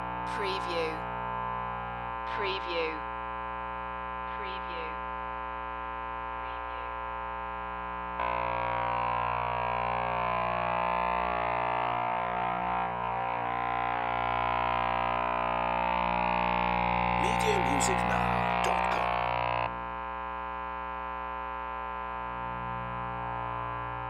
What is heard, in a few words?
Amp Noise and Static